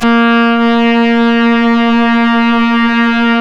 Synclav FM STRINGS A#; Big Fish Audio

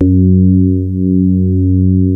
DX PIANO; Wizoo - Powered DX

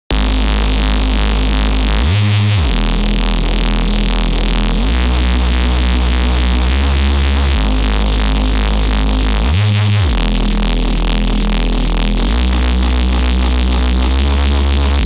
Reese, bass

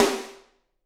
SD, Drums; Bob Clearmountain Drums I

